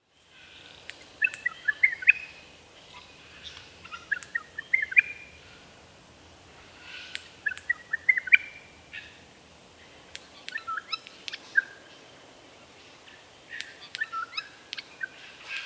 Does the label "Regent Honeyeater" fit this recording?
Yes